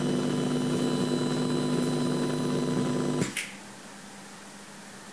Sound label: ground loop noise